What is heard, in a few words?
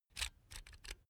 electric drill template adjust soft; maschinen; bohrer; soundpool